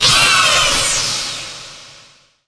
falling, arachnatron; scourge

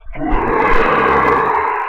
big angry, aliens; ufoai

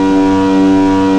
engine, cars; vdrift; M8

